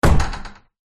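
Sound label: Break Down Door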